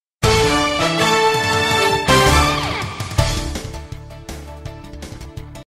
news stinger

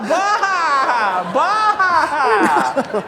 bwahaha; Tim & Eric

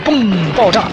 boom

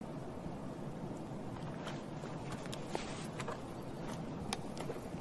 inventory

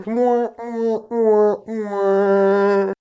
sad trombone

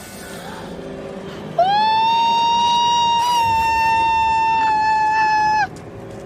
wail

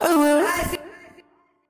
uwu owo uwu

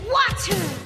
water

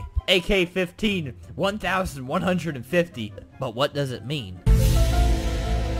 rap battle; roblox

